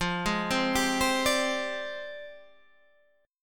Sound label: F Minor 6th Add 9th, Guitar Standard; JGuitar